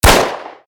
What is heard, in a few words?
deagle